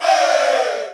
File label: EHHH!! 93bpm